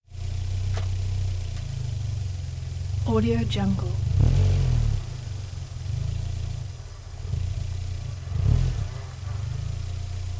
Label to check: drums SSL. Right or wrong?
Wrong